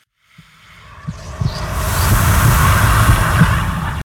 portal, trigger

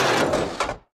irongolem throw

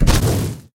ignite, fire; HunterZ G4; minecraft

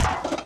walk, mob iron golem

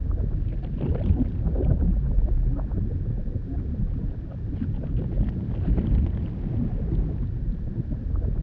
water underwater loop; matmos interactions

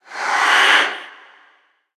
idle, mob endermen

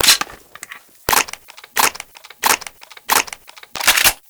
M1014 Reload; Modern Weapons Pack

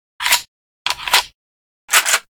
PPSH Reload; Modern Weapons Pack

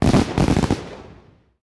twinkle far, fireworks; HunterZ G4; minecraft

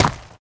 gravel, dig; HunterZ G4; minecraft

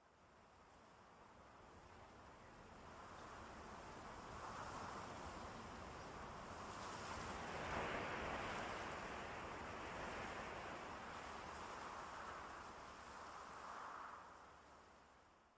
tree wind; matmos hl

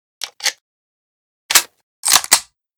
mp40 Reload; Modern Weapons Pack

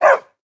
bark, mob wolf

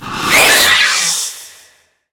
scream, mob endermen

